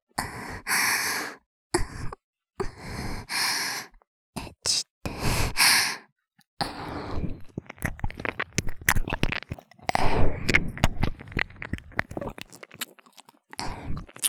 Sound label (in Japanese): 耳舐め 喘ぎ; エッチしよ